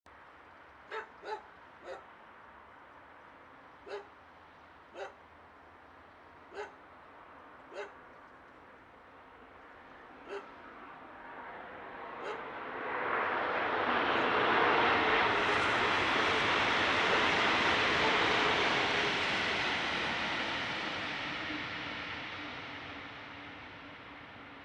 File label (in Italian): Treno mono; Eigenmike; A-weighted; Nuova Strada